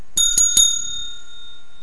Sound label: Ding Ding Ding; Heitz System